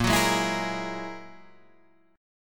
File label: G sharp 7th Suspended 2nd Sharp 5th